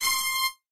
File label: music chirp